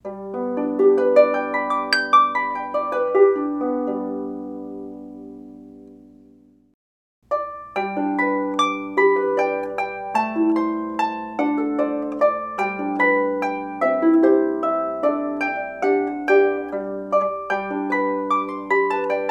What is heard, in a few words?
Harp 22 String; Brittany